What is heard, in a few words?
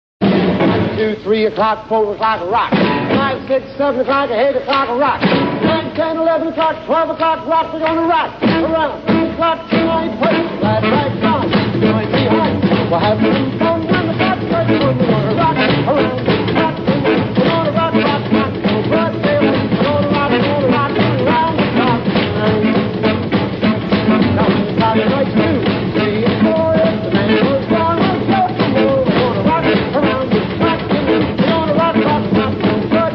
rock; castellana; infants